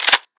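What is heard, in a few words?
bolt back, rifle; ak74; cof; guns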